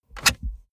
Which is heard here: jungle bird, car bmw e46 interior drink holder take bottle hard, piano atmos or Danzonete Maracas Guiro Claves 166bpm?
car bmw e46 interior drink holder take bottle hard